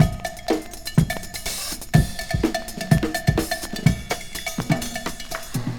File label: Drum loops Funky